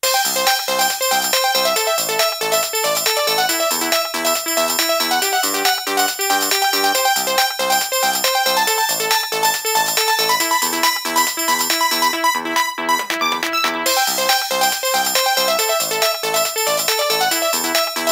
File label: nokia 1208 trance ringtone